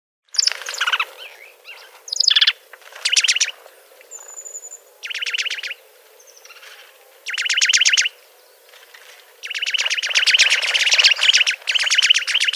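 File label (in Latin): baeolophus ridgwayi, Sikory; sikora mysia; ptaki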